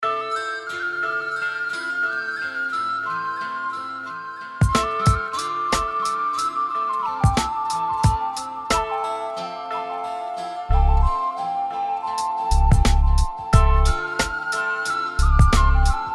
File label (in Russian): piano fleita